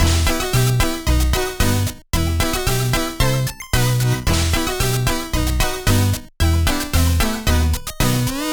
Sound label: music castle intro